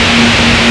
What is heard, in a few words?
drum energy; E-MU Formula 4000 Series Vol. 3; Analog Odyssey; VK+ Instruments